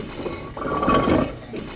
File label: spit; HereticII